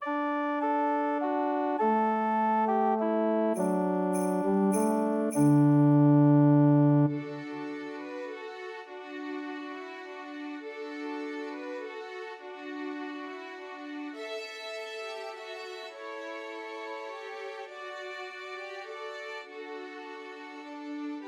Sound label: Trios for Three Violins; Silent Night; Christmas